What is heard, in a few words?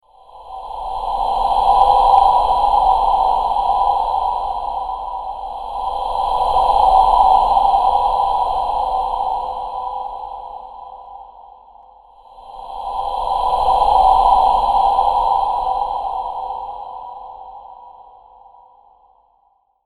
ghost voice ringtone